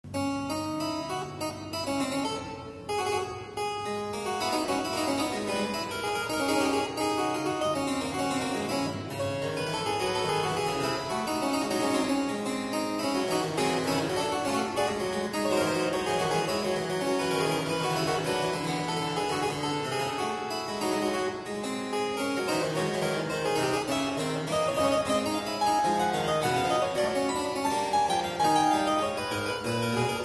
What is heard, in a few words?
Fugue in d minor; Book I, BWV 851